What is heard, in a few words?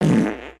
fart reverb